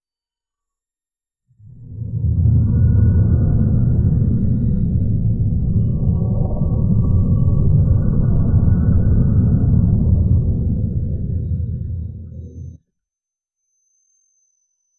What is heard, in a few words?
sci-fi ships to land